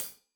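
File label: hat closed, hi-hat cymbals stereo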